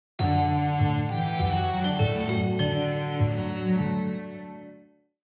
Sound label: Cartoon kids logo intro